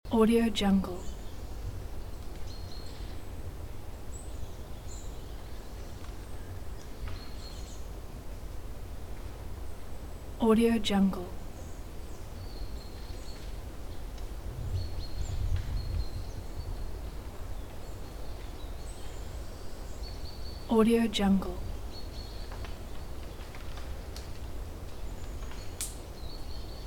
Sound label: humming autumn forest with birds loop